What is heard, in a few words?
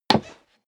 step leather shoes wood creak single stair up